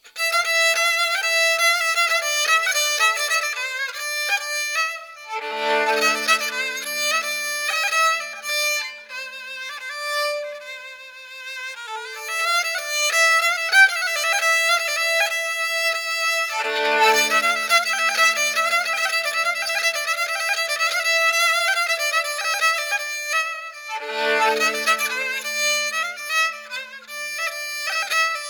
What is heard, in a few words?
Kamancheh Improvisation; Played by Kayhan Kalhor